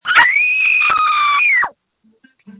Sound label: screams